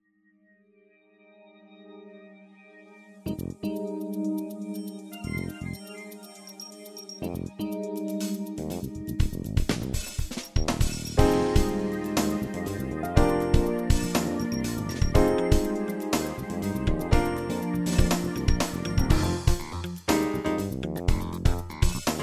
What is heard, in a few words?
XV2020, jazz, synth